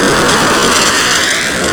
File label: plasma loop reversed